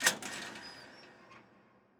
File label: button Paytable; netent